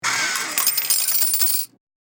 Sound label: Vending machine coins dropping into return